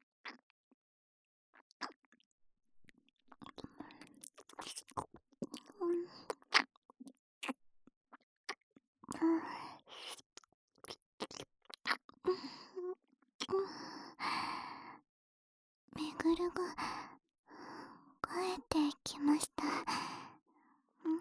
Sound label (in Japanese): ねっとり 耳舐め（左耳）